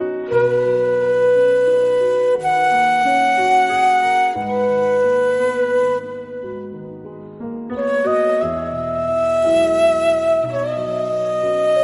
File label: flute cover